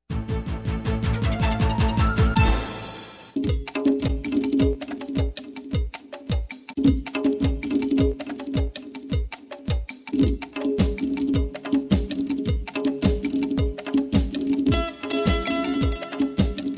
Instrumental

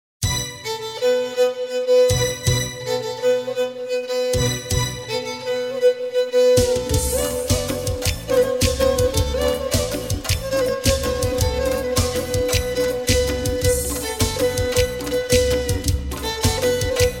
Instrumental

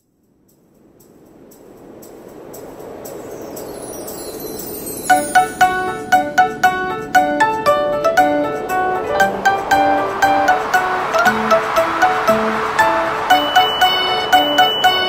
Jingle Bells Instrumental

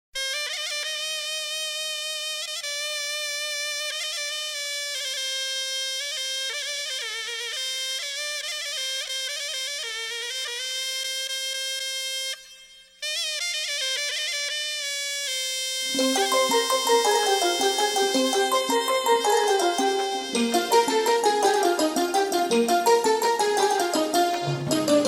accompaniment

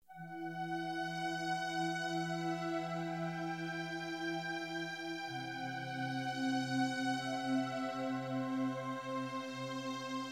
Instrumental

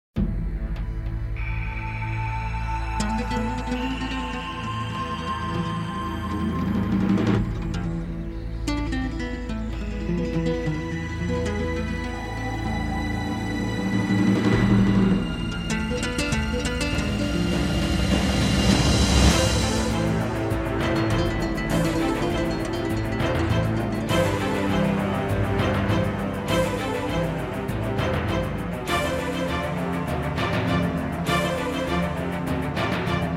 Instrumental

